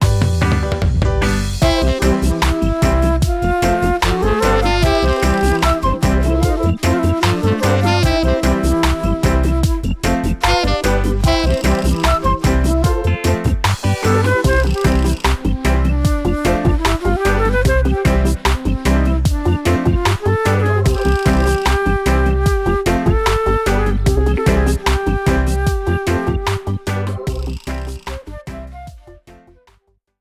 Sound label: African Flute